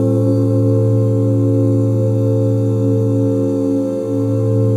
OOH A SHARP9, OOH CHORDS, Vocal Jazz; ILIO; Vocal Planet; Jazz & FX

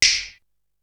Clap, Drums; ZERO G - Datafile DISC 3

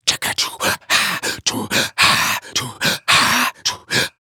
African Mouth Groove; Continental Drift Aftershocks; Vocals

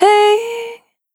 Dry, Single Voice Melodic Loops; Muon; Singles

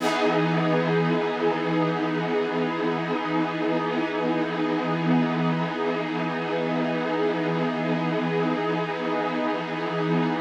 Poly String E maj, synth orchestra

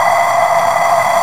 G# vox noise; AKAI S6000 CD-ROM - Volume 5

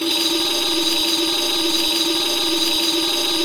Rek Roll; Roland BK-5; Percussion

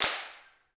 PRC HNDCL, Clap; Rhythm Section; Clap & Snap; Clap Modules

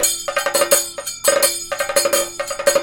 KATAK, Indian Traditions

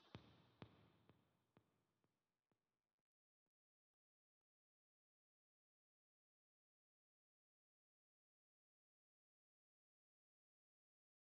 Lead Guitar, 95BPM Amin; 99Sounds; Monosounds; Music Loops; PANDORA BOX!; Romance

